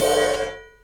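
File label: crash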